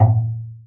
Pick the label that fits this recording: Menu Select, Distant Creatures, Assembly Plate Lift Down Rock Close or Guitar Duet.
Menu Select